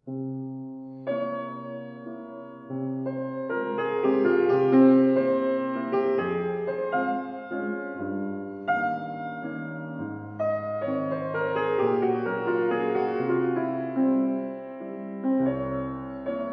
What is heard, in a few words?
Mazurka in f minor, fortepiano; Chopin